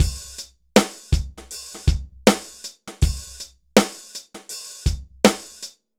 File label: dry Loop BPM 80 Dry, AKAI S6000